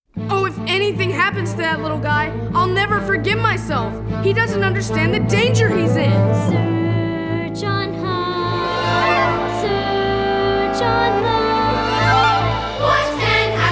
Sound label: vocals; mowgli runs